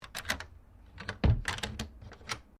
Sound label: KEY LOCK OPEN; Locks; Objects